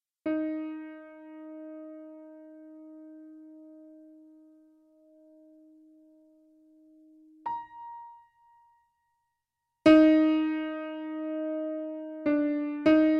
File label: piano no reverb